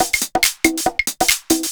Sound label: TECHNO PERCUSSION 140